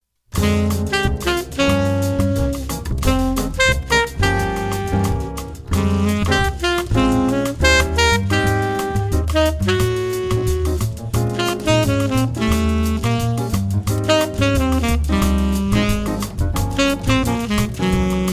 sax etudes tenor

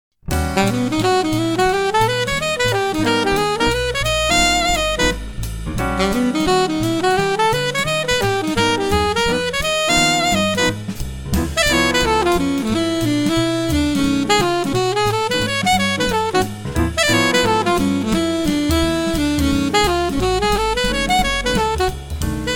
Hip Licks for Sax Alto Slow

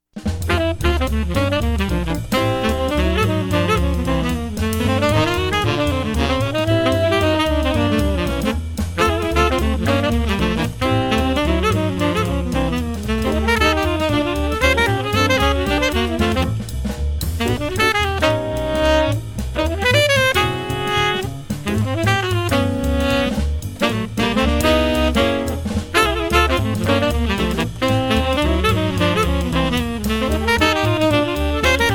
Sax Duets tenors

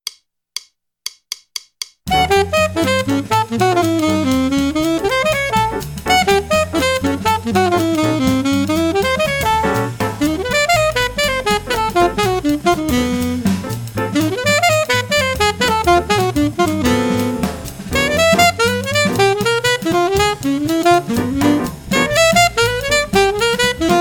Hip Licks for Sax Alto Sax fast

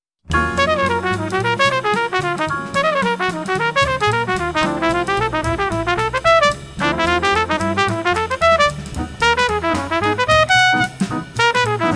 hip licks for trumpet fast

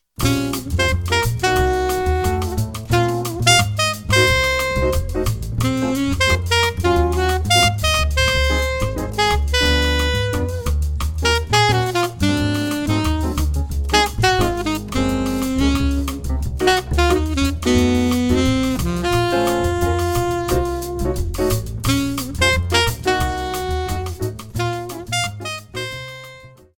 sax etudes alto

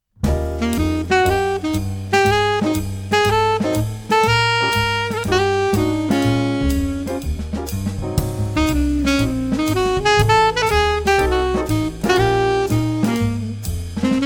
etudes alto; broadway street

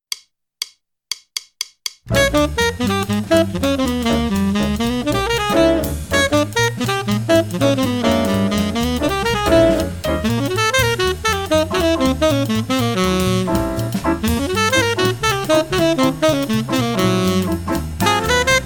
Hip Licks for Sax Tenor Sax fast

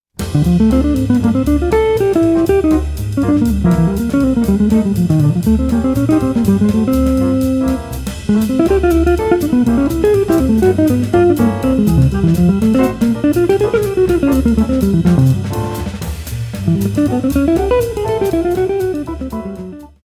guitar etudes; michigan avenue

